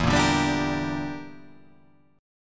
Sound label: E Minor 7th Flat 5th E